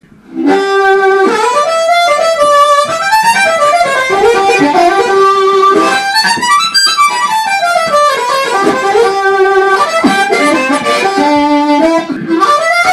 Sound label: AG Amplified, harmonica